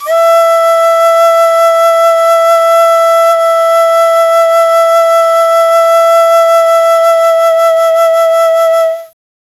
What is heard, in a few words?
dizi flute, wind instrument